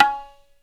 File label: PERC